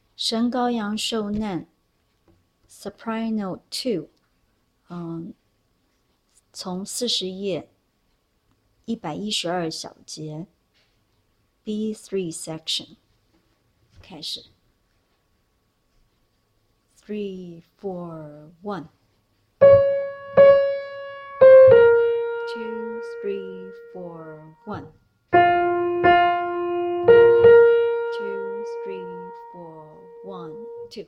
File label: Soprano